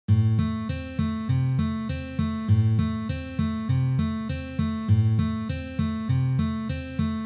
Akkompanement na gitare arpedzhio

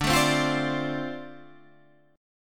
Boj Dm7

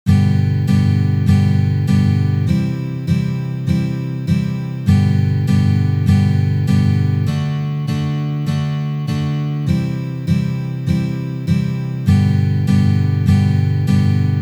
Uprazhneniya dlya igry akkorda Sol mazhor na gitare

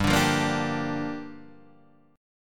akkord gm7 boj

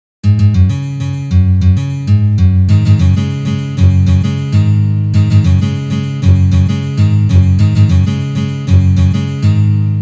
Uprazhneniya Rok na gitare

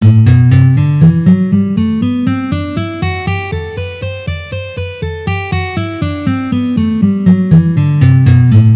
Gamma Sol mazhor Tri noty pozitsiya

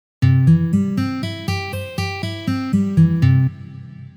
Arpedzhio na gitare Do mazhor

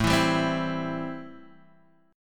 Boj Am7

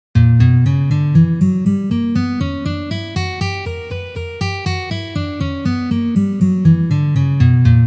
Gamma Si minor garmonicheskij vid pozitsiya

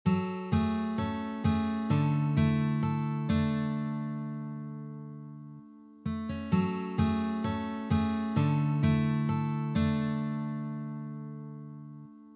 na gitare

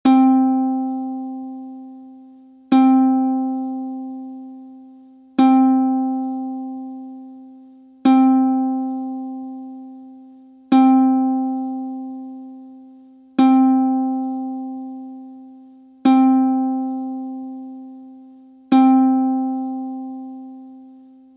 1 struna Zvuki strun ukulele

